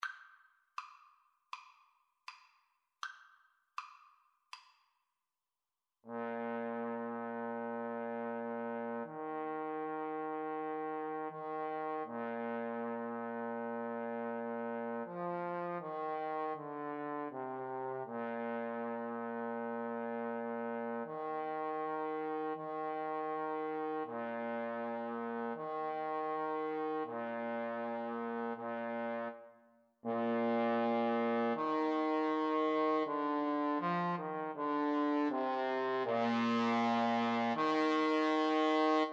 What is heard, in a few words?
Trumpet Trombone Duet